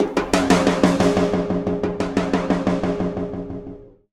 90 bpm beats; torchlight iii ps4 switch windows xbox one gamerip 2020

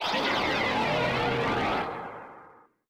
Roar Monster; Nari Kids Park Ultraman R/B

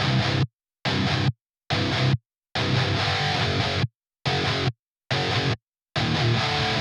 Drums and Jingles Explore - Guitars; Metal Tales Fury of the Guitar Gods

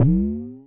Arrow Grows

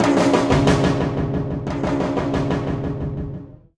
90 bpm beats; Torchlight III; PS4

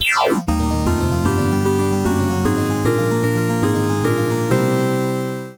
victory; Hololive Isekai

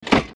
Miss; F.A.M.P.S

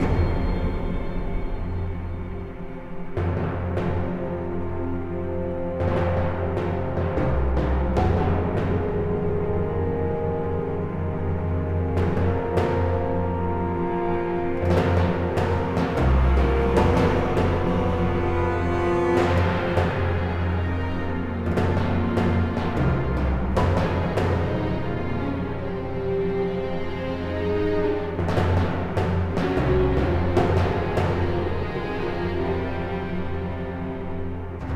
intro countdown; heroes of order chaos; android ios mobile gamerip 2012

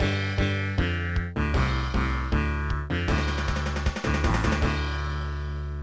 Level Complete; Downhill Skateboarding; Nicktoons plug play

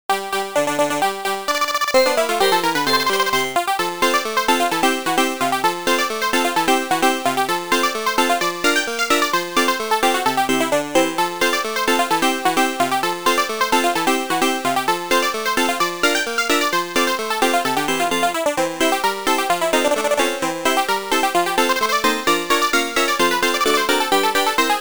Hurry up music; Hopping Mappy

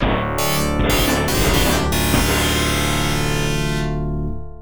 Round Start; Final Fight; arcade

